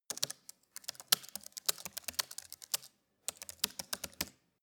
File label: keyboard typing